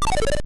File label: Sfx Big collect; Pizza Tower early build test ost